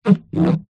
balloon rubber stretched out rub squeak

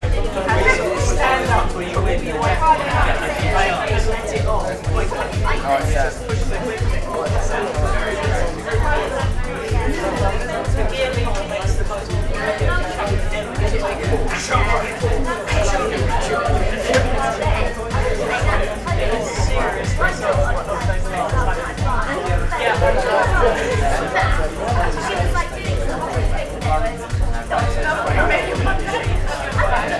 ambience bar small busy dance music; sound effects one

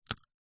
human eat bite nut almond

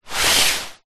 household umbrella closed slide ground

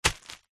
foley footstep single on plastic sheet

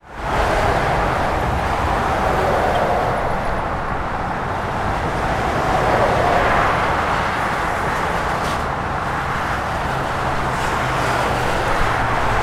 transport traffic motorway busy m1 uk

